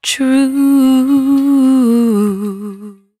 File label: 113bpm C#, Velvet Vocal; Zero-G